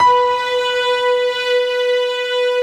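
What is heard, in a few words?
piano strings, B4; Best Service; Advanced Media Trax Modern Composer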